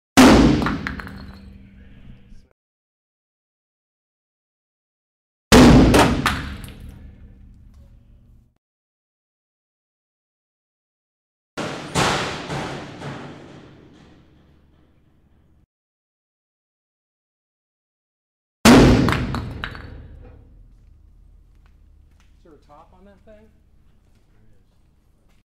Brick on Metal

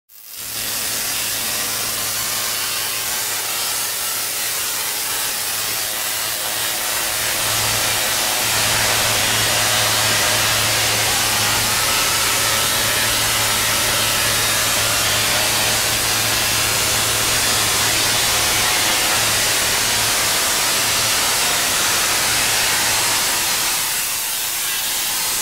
Static Constant Electricity Stream

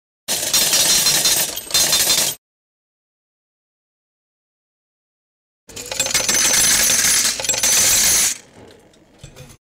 Coin Dispenser

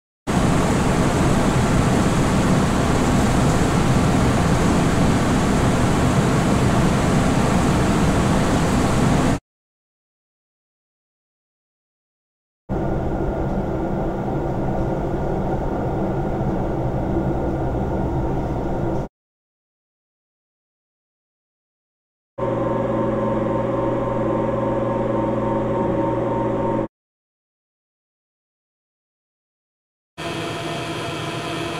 Motor Low Air Hum

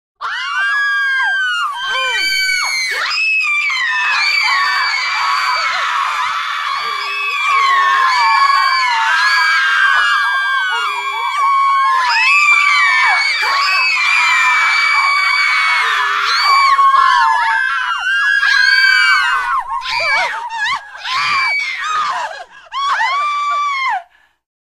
SCREAMING WOMEN

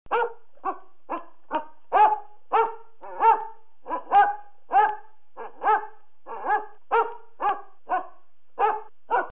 Basset Hound Barking Dog

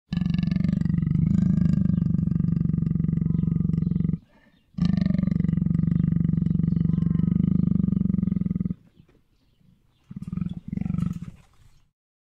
Lion Snarl

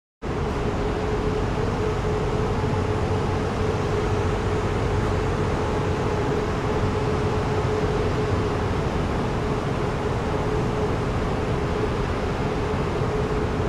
Subway Train Ambiance

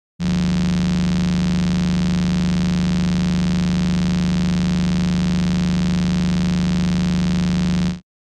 Electric Hum

